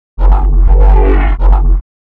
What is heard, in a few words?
Bass loops; neuro; Optiv & BTK